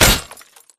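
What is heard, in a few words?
Impact Pulse Blade Metal; Titanfall 2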